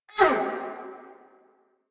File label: Pain; Grineer Queens Guards; Warframe